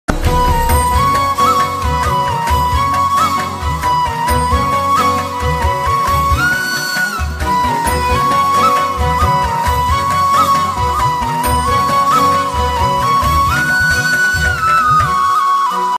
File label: BGM ringtone